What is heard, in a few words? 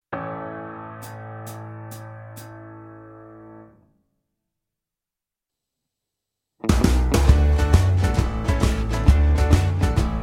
A No Backing Vocals, backing tracks